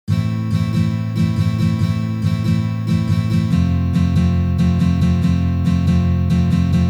boj na gitare

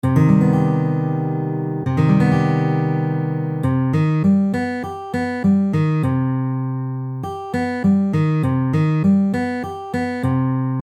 Septakkord Cmmaj7

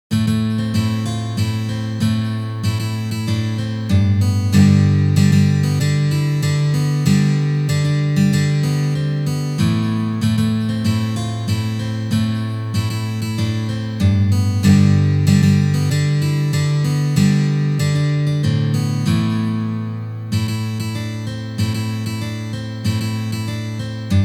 Lezginka na gitare

